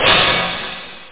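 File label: splash cymbal; OctaMED SoundStudio V1; jazzies samples; perc; cymbals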